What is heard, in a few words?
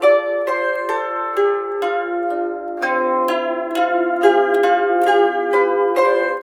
SITUR, SITUR PH; Spectrasonics; Heart of Asia